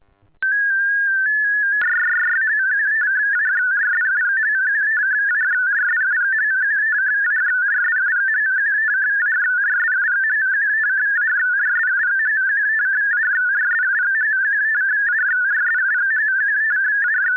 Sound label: ALE400 sounding; ARQ-FAE 50 Bd / 8-FSK MODE; FAE = FAST ACKNOWLEDGED EXCHANGE by Patrick Lindecker / F6CTE